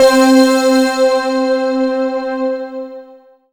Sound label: SYN O1W; Best Service Gigapack 1; SYN DIG3